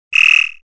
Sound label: Swamp animal